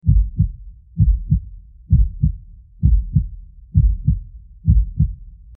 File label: HEARTBEAT